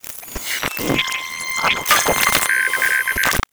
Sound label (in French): objet composite